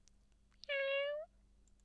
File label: Meww